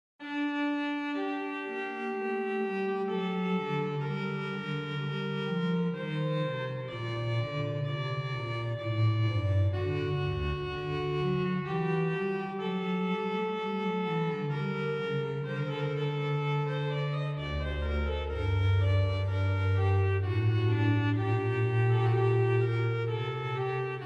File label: Andante, OrganMusic; Boely; Souvigny